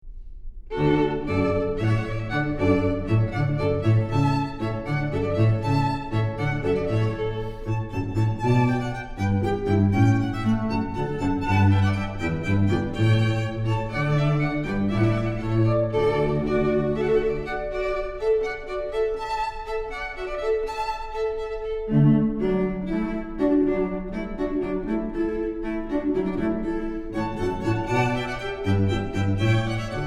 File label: Hornpipe